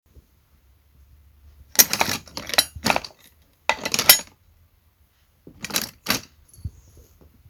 Metal Armour

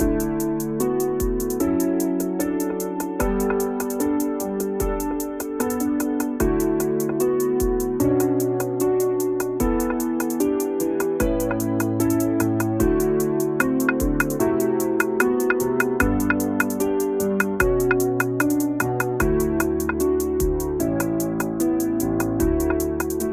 Lofi Piano Beat

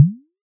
Bubble Small

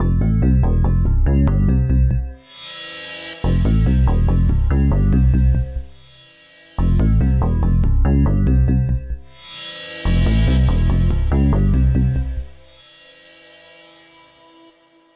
Horror Bassline Intro

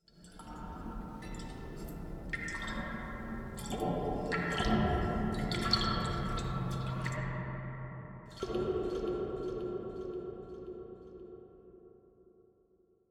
Sound Of Water In Cave; Sonido De Agua En Cueva

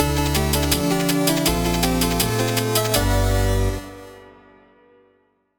Chiptune Intro

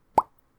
Popping

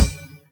Ride

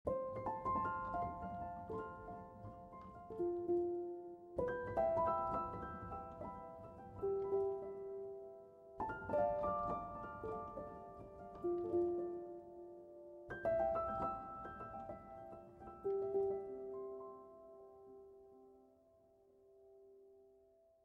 Piano Fairy Tale Intro

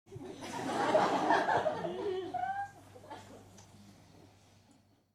Laugh

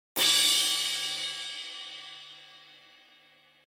Crash

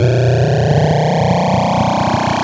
gear

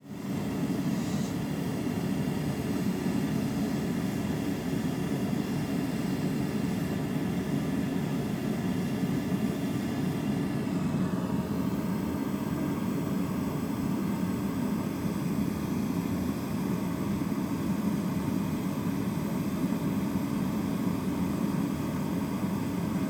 Propane Gas Tank Stove Interior

